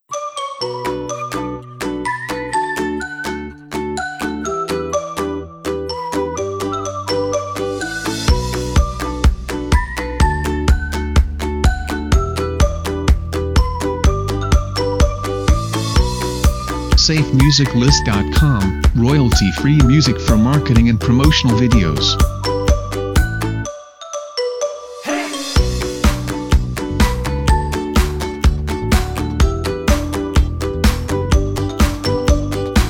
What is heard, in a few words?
Fresh Summer Feeling; SafeMusicList